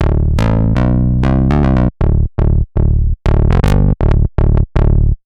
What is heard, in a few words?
Moog Messenger; 合成器